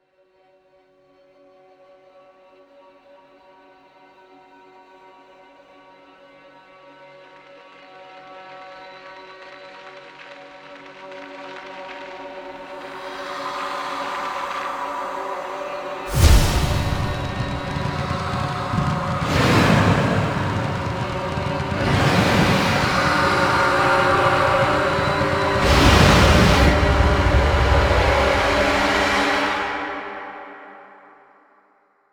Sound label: Memory First Sinner sound